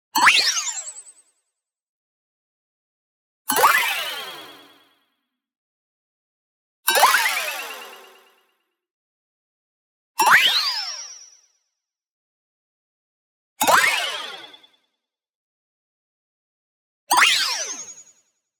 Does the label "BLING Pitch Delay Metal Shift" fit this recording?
Yes